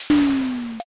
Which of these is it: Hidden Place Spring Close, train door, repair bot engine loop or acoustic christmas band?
train door